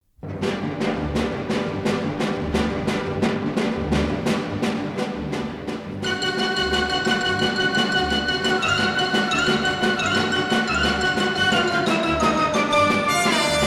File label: Orchestra, Sabre Dance; London Symphony Orchestra; Gayane